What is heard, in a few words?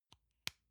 lotion lid close soft; friseur; berufe